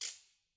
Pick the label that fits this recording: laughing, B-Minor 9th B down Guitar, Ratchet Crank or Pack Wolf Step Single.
Ratchet Crank